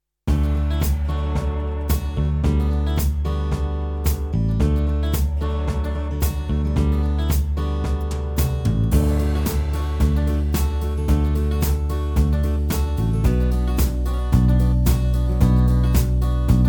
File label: country pop music